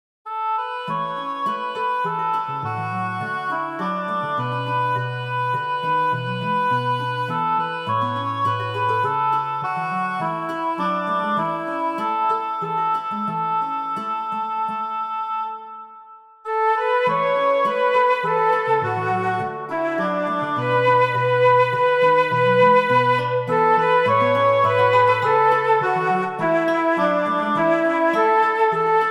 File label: Small Ensemble; Stowey; 3 Verses